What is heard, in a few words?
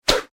Whip Harsh Fast; Foley; Objects